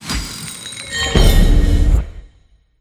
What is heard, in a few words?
Ui Mastered item; Dreamscaper; xbox series xs